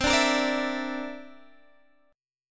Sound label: D 7th Suspended 2nd Sharp 5th C